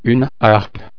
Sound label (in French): harpe